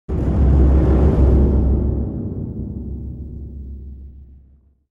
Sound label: STINGERS; Bourne